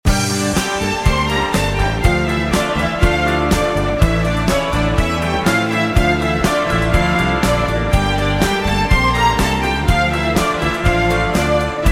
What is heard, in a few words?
shofar